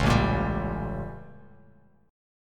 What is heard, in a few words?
A 7th Flat 9th A, Bass Standard; A7b9 strummed; JGuitar